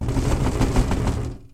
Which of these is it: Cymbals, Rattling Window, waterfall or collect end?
Rattling Window